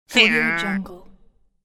cartoon goose disappoint

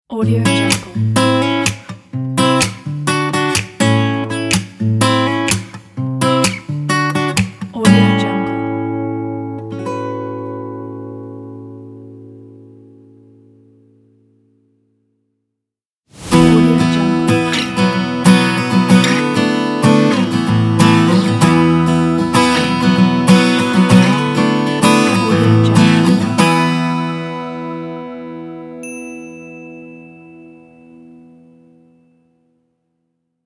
acoustic guitar logo pack

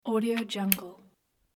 cable connect

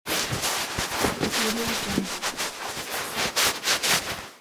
drying hands; reanimateaudio

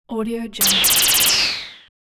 space laser

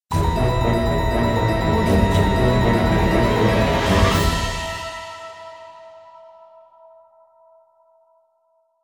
horror castle stinger

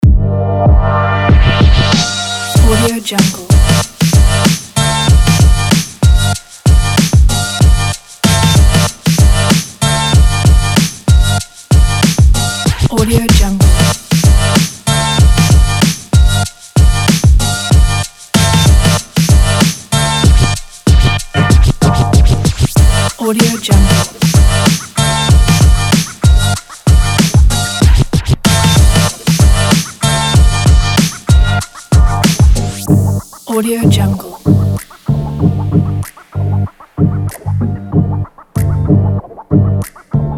energetic upbeat sport hiphop

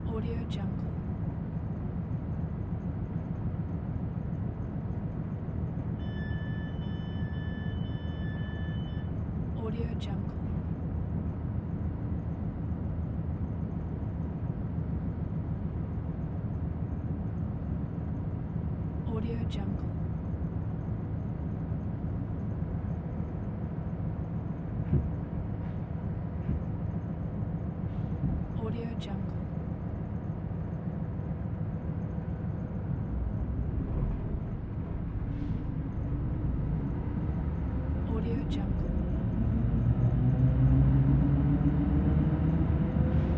old italian diesel train interior departing

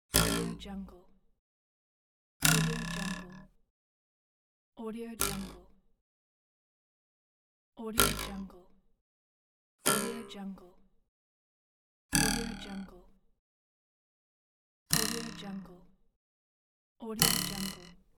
wooden poing

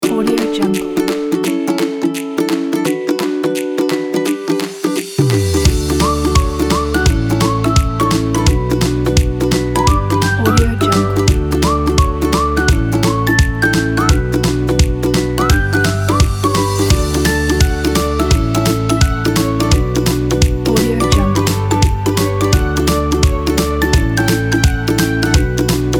happy children music

